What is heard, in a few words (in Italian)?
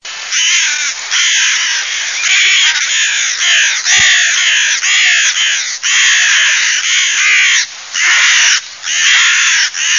CORNACCHIA